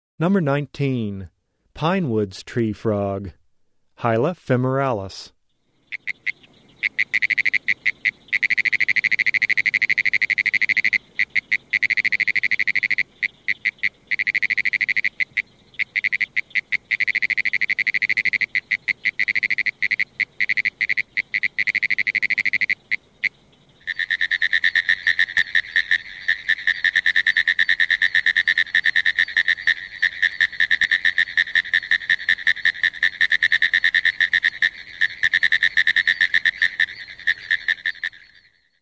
Hyla femoralis - Pinewoods Treefrog, Frog Calls; Herpetology; Bio227 - Herpetology

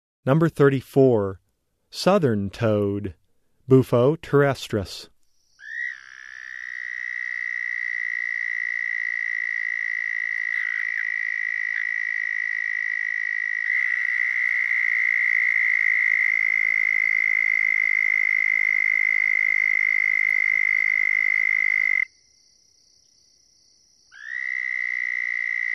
Bufo terrestris Southern Toad, Frog Calls; Herpetology; Bio227 - Herpetology

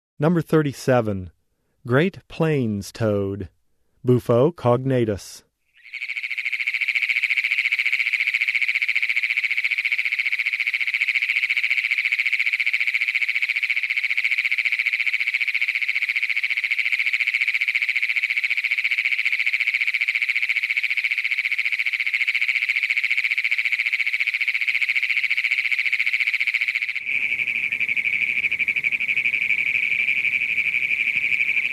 Bufo cognatus - Great Plains Toad; Frog Calls; Herpetology; Bio227 - Herpetology

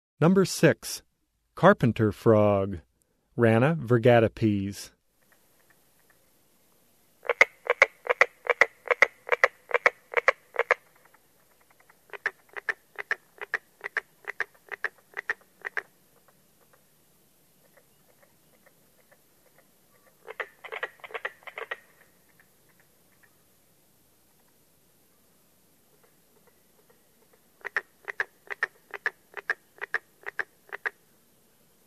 Rana virgatipes Carpenter Frog, Frog Calls; Herpetology; Bio227 - Herpetology

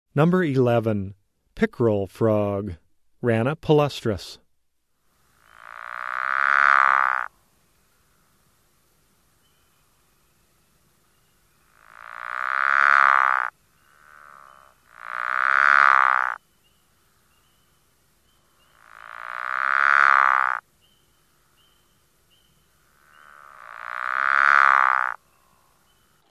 Rana palustris - Pikerel Frog, Frog Calls; Herpetology; Bio227 - Herpetology

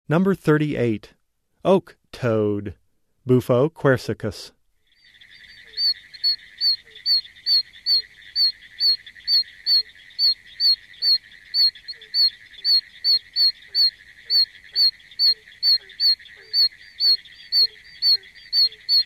Bufo quercicus - Oak Toad; Frog Calls; Herpetology; Bio227 - Herpetology

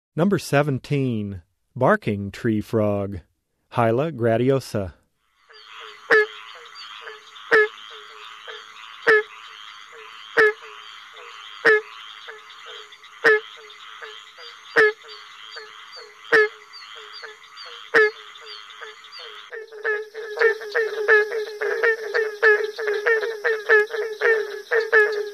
Hyla gratiosa - Barking Treefrog, Frog Calls; Herpetology; Bio227 - Herpetology

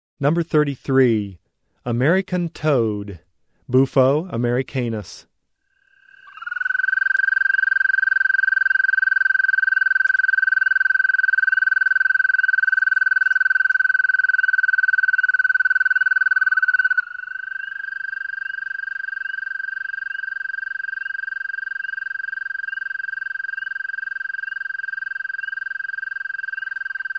Bufo americanas American Toad, Frog Calls; Herpetology; Bio227 - Herpetology

